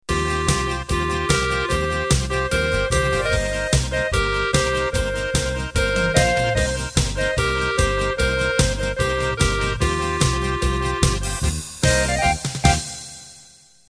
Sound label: Polka Bavarian end